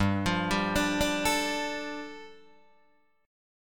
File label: G 7th Suspended 2nd G, Guitar Open D